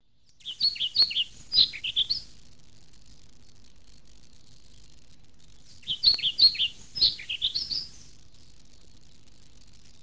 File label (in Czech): lejsek